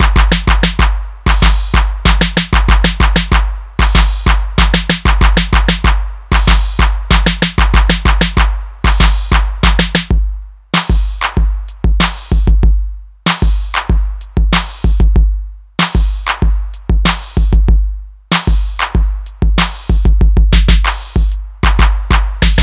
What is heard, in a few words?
hip hop